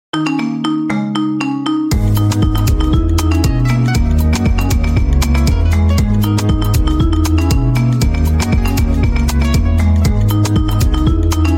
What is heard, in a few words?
Marimba; 着信音